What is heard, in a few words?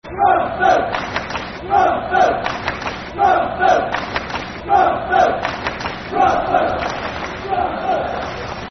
Bradford (Clap) [Football Chant (Soccer Song)]; Bradford City Bcfc